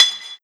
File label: Perc Metal